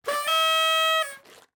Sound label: party horn; twitch